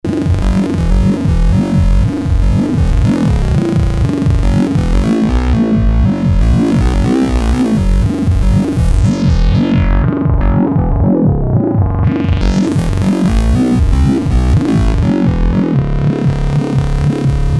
synth, korg ms20

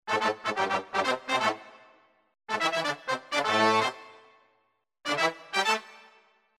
brasso, yamaha tg500; Brass: kinda

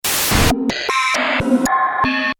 pcm, roland jd800

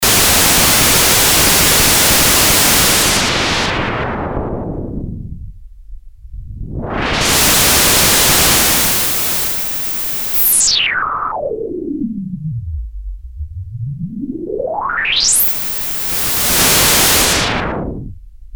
XP noise filter, Roland alpha juno synth

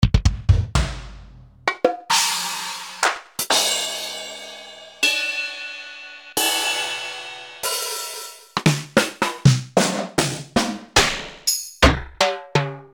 drum, yamaha rx5

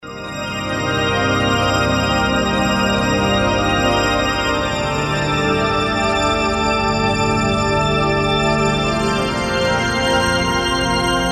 pad magic, yamaha sy99 synth

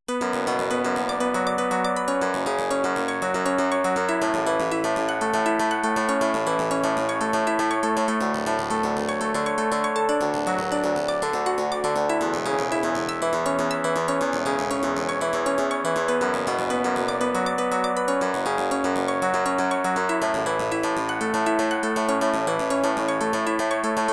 korg z1; pluck arpeggio

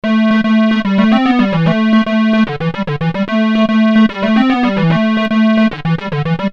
synth square; emu carnaval

